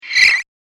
Backward Electronic Vacuum; Whoosh Sound Effects

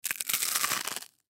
Human Bite Food; Bite Food Cracker; Eating; Sound Effects:Human

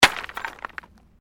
Digging Pick Stones; Various digging tools and processes; Construction Sound Effects; Construction (Sound Effects:Backgrounds)

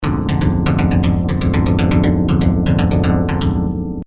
Resonant Evil Loop; Miscellaneous Loops; music loop; audio logo, web background, videogame music; Andrew Fleming

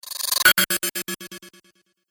Reverse Cymbal Whoosh

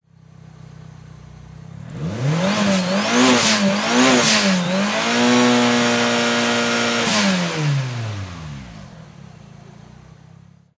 Mitsubishi Eclipse Engine Multiple Revs; Auto, Power Car, High Performance Car Sound: Mitsubishi Eclipse. Engine perspective. Revving; Race Car

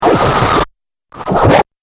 Dubstep Scifi Effects; Science Fiction Sound Effects

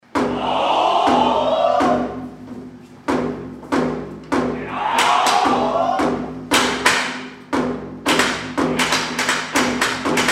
Kabuki Theater Male Performance Music Whips Japan

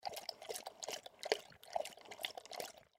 Dog Drink Water Lap Tongue Plastic Bowl; Animal; Dog Sound Effects

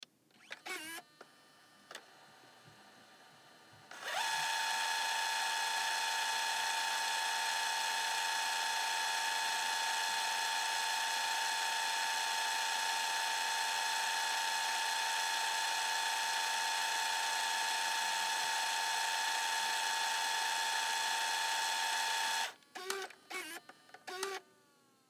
Camcorder Rewind, Sony Mini Dv; Camcorders; Electronics; Blastwave

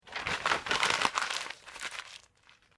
PAPER SCREW UP; Material components for constructing more complex sounds; Paper (Sound Effects:Materials)

